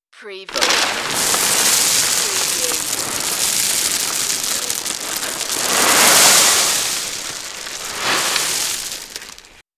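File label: Wheelbarrow Gravel Dump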